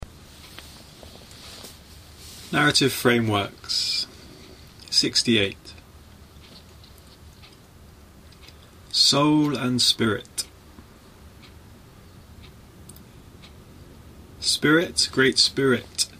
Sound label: spirit soul electronic